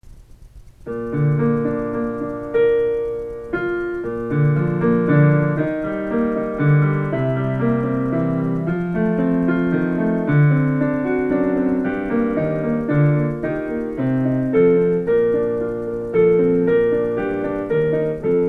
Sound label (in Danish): AKKOMPA